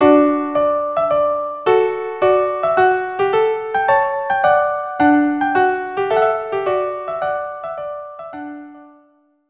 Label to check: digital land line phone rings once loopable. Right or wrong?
Wrong